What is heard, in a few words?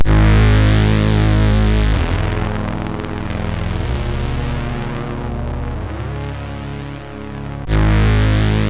Trip, MOS6581; sid; MOS6581R3